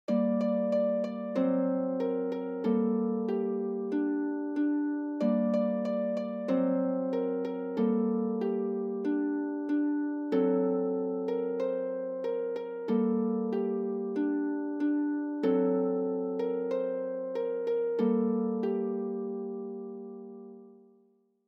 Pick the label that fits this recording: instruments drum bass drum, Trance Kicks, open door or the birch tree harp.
the birch tree harp